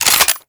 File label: Heavy Shotgun Reload Finished; Warframe; Tenno